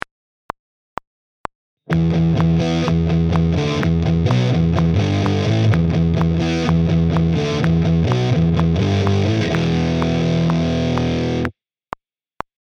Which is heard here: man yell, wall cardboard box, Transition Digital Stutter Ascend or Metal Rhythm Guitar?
Metal Rhythm Guitar